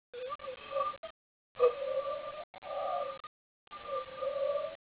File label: agony